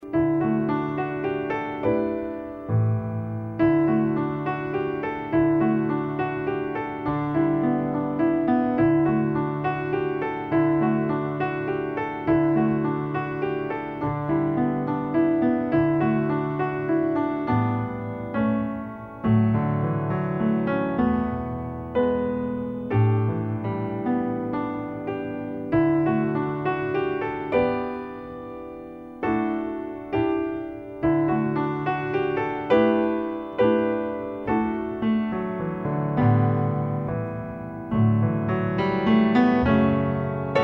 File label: longing for spring instrumental